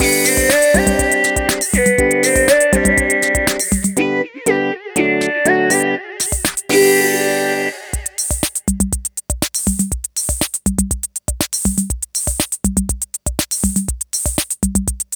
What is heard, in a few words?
Losing Jingle (World Incomplete); Super Monkey Ball Step & Roll